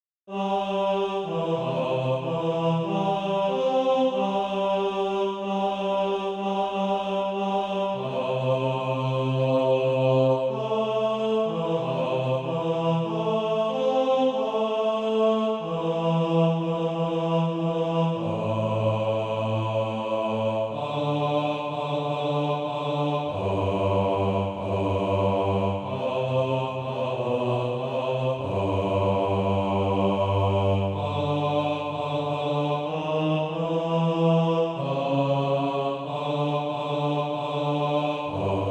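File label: bass, Practise Music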